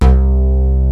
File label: BIG BASS, PEAVEY DPM; OTHER SYNTHS; Rubber Chicken Software Sample Bank